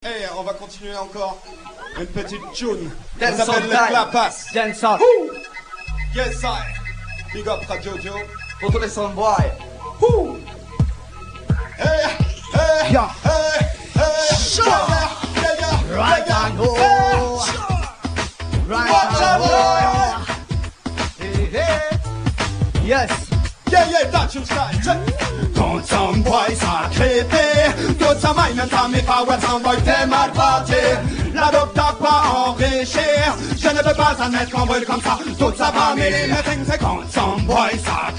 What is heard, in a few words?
clappas